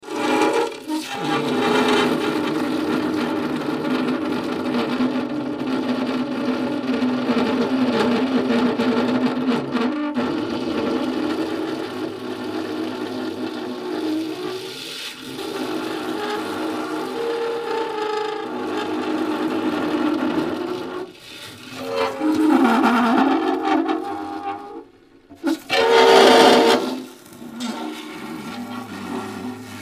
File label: Long Scrape Low Frequ